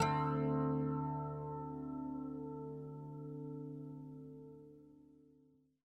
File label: guitar chord; amb; angband